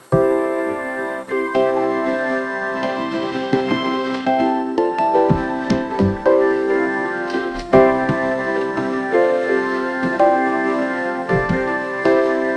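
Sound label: stepping up happy celebration, mafia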